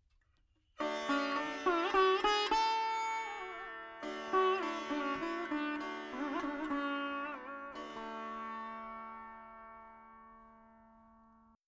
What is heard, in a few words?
4 4 D 100 Dry, Melody Veena; Sample Banks